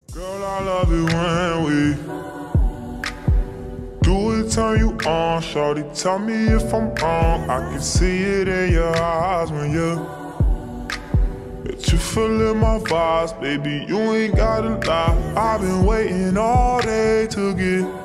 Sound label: slowed reverb